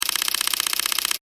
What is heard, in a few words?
toy machine gun firing; Toy Machine Gun Burst; Toy Guns